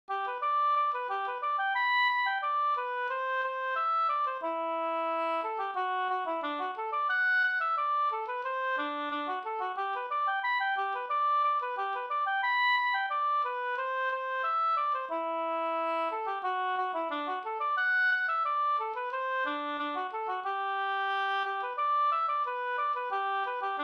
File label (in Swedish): ganglat Gdur; Elg Anders; Rattvik